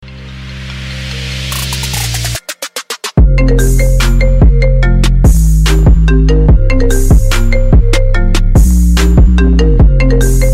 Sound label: rington iphone remix